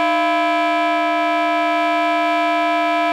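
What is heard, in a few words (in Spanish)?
Complejos, saxofón multifónico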